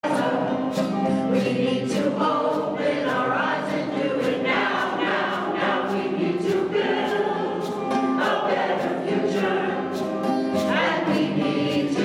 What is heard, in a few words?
Walkabout Clearwater CHORUS